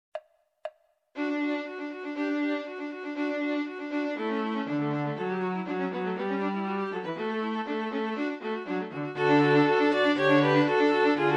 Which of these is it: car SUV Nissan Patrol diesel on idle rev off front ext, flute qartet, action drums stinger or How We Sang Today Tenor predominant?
flute qartet